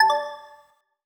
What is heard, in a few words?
bumper end; steam; steamui